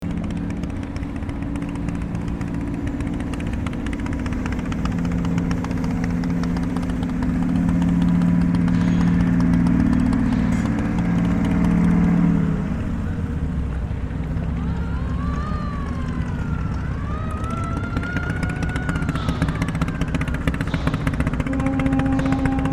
Buriganga, bangladesh

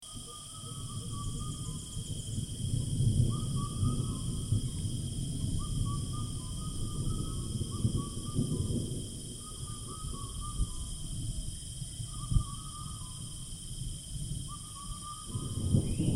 terai thunder

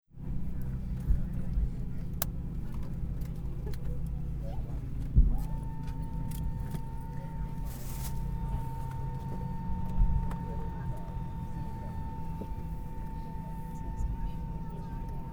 Tampa Airbus Ambiance

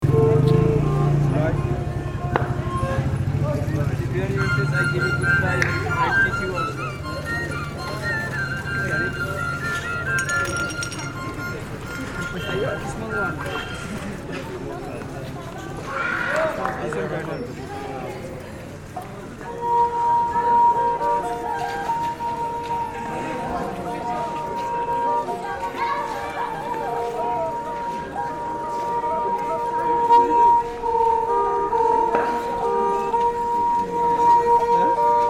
sarangi sales, nepal

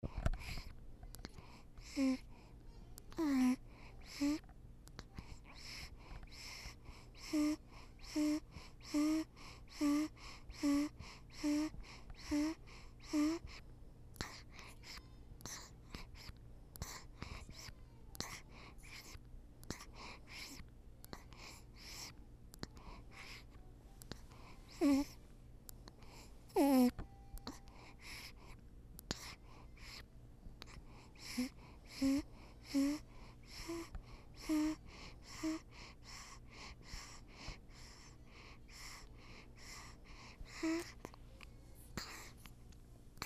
Ember Dines, San Francisco

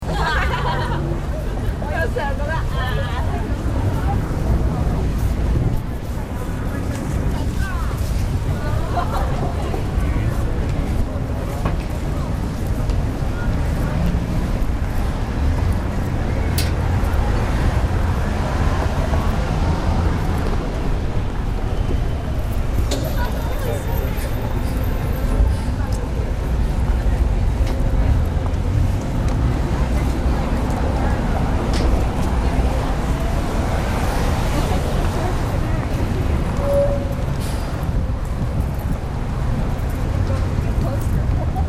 chinatown

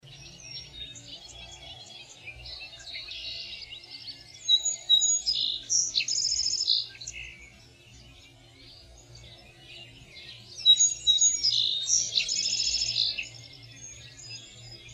birds of verona

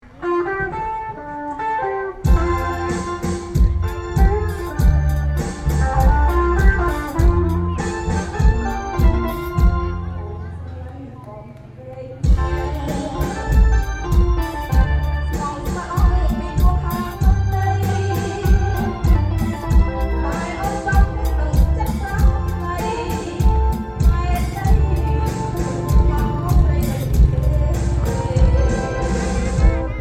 party band, cambodia

